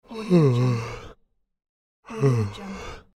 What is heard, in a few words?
yawning